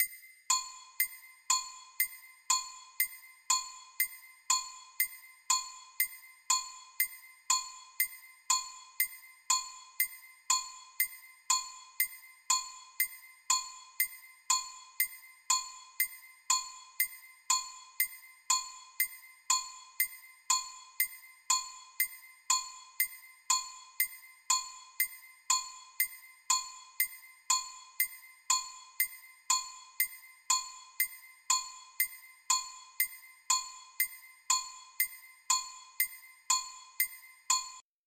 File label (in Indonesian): Nada Dering Nokia Jadul Clock alert